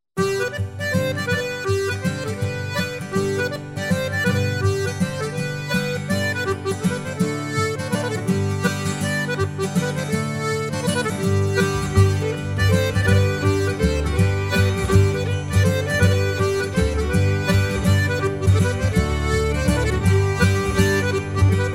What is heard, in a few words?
Suite Gavotte